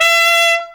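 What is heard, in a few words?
CORN LIPS G5; AKAI S-Series CD-ROM Sound Library VOL-2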